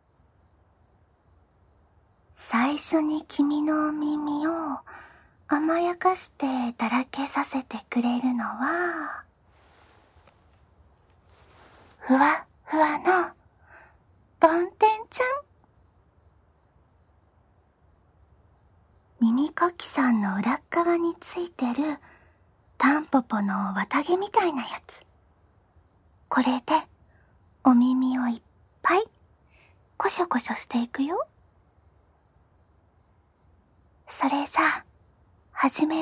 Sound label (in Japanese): 膝枕で梵天・赤ちゃん綿棒・ヒノキの耳かき(右耳), ASMR